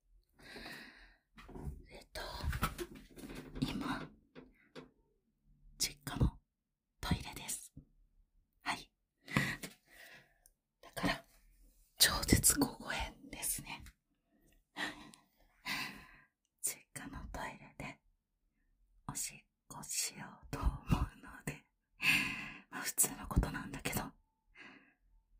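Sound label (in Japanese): 実家のトイレでオシッコ！オナラ出ちゃいましたぁぁぁ…; 怪しまれないようにしないとぉぉぉ…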